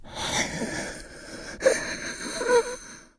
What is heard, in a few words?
breathing, infected; npc; idle